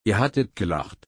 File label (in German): lachen